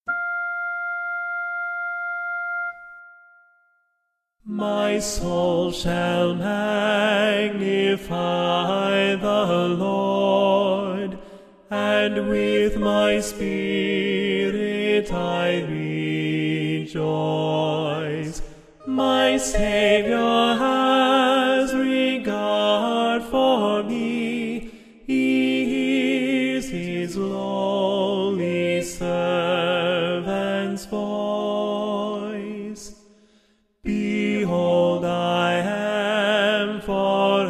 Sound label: magnificat tenor